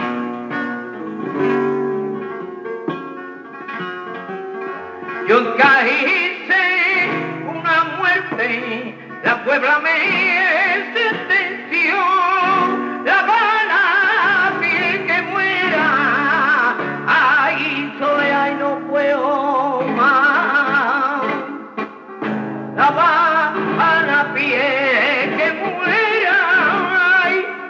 Solea petenera, flamenco; Oliveran

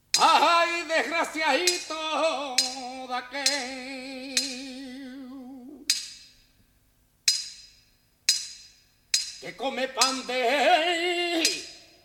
martinete, flamenco

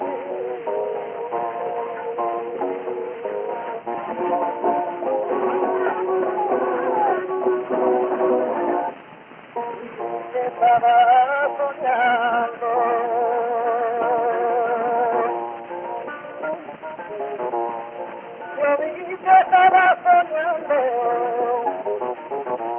Tangos tientos, flamenco

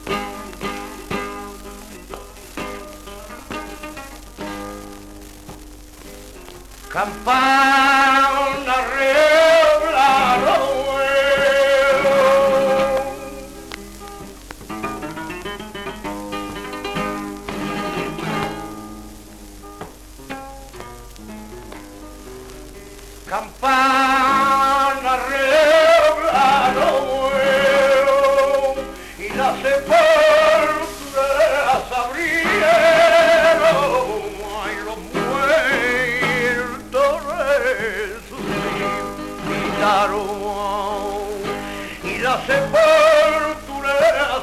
Solea, flamenco; de Santamaria

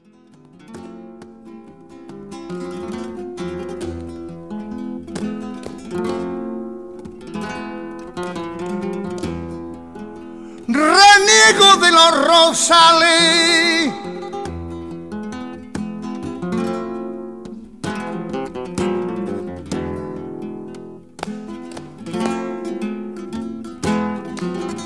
Solea, flamenco; de Charamusco

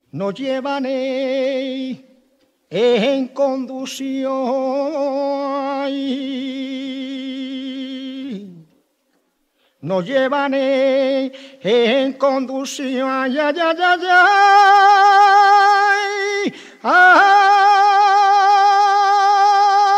tona, flamenco

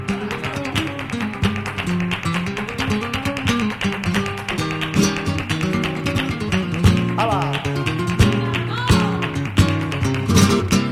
buleria, flamenco

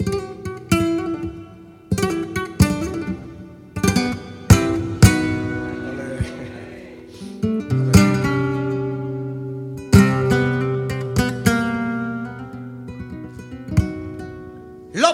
Solea, flamenco; de La Roezna

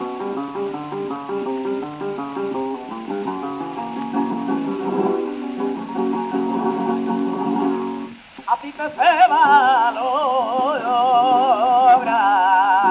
Solea, flamenco; del Fillo

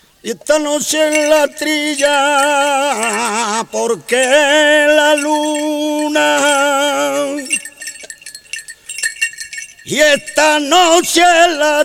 Cante de trilla, flamenco

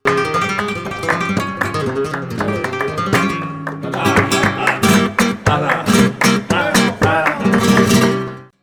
falseta, flamenco

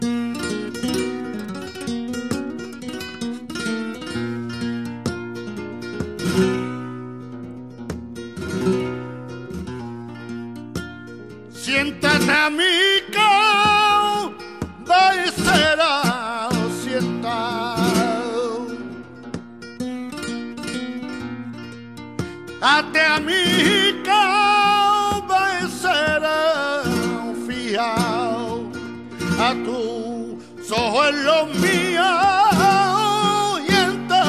Solea, flamenco; de La Andonda

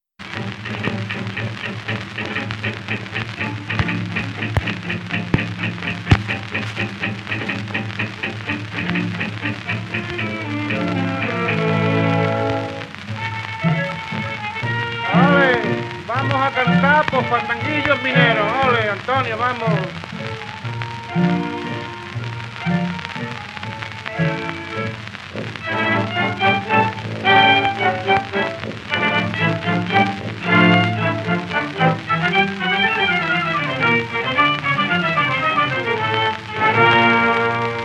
minera con orquesta, flamenco

